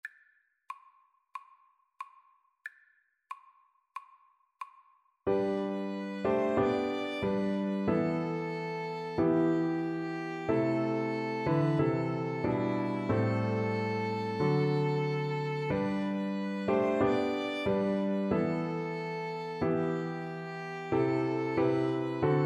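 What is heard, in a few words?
violin piano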